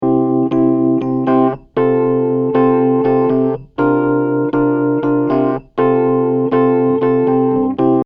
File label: Phaser